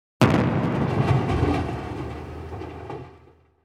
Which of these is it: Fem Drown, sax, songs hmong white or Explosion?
Explosion